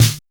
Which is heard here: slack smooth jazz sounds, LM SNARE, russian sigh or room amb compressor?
LM SNARE